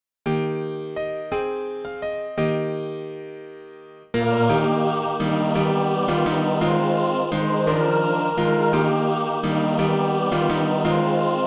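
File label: mixed, Practise Music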